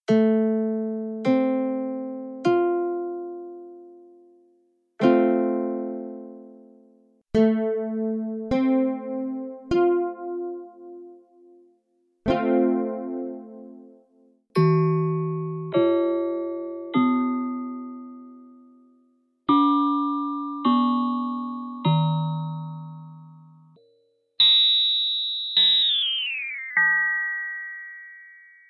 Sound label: Freq Shift Guitar